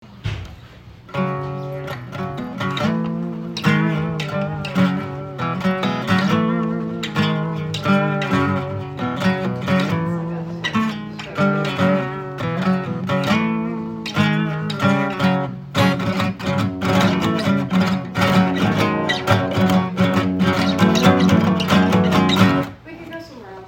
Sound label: gtr hook